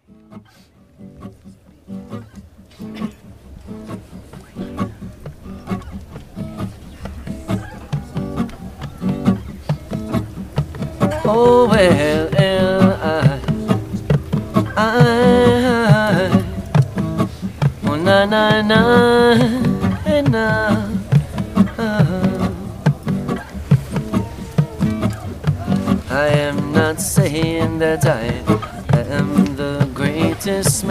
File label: Rasta Roots Melody